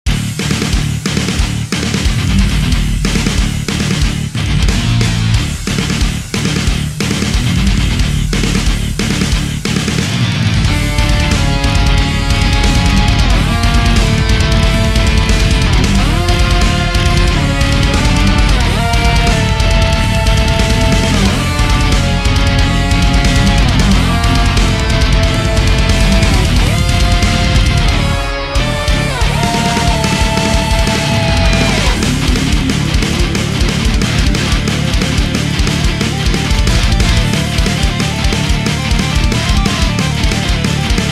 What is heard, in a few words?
battle theme metal version; la signora